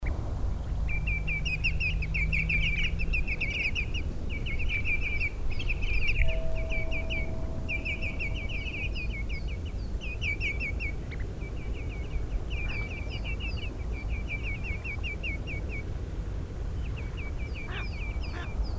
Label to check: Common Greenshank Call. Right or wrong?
Right